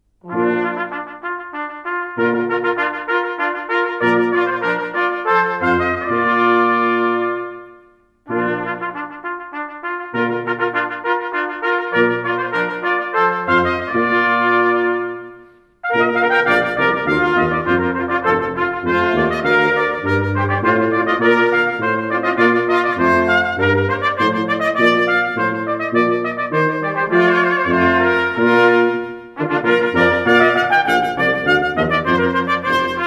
Fanfare, blechblaeserquartett; Purcell

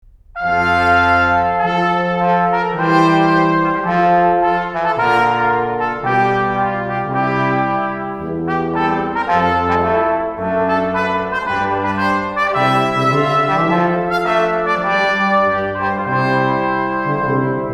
Air, blechblaeserquartett; Lully

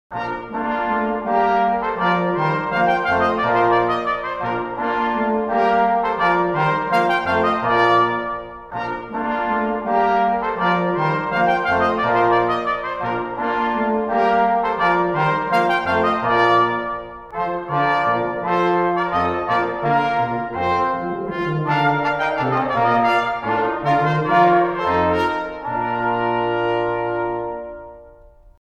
Blechbläserquartett; Clarke Minuett

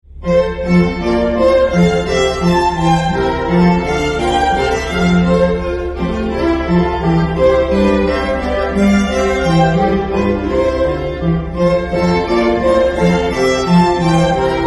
Bouree Streichquartett; Haendel

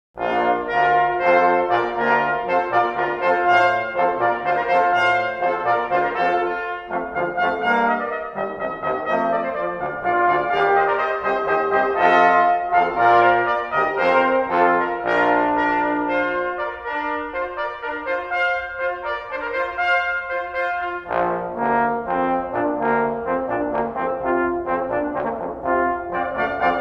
Hornpipe, blechblaeserquartett; Haendel

